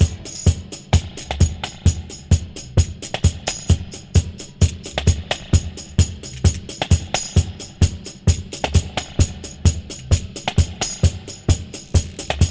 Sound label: 131 bpm classic drum loop f key, kick tuned to the F note